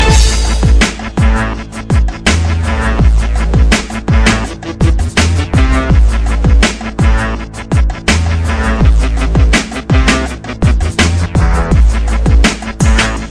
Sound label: Shakin Beat